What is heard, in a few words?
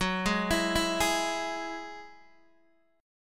F sharp 7th Suspended 2nd F sharp down Guitar DADGAD